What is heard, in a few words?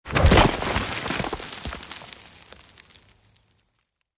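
Event Night Ravine Fall Dirt Debris; the unfinished swan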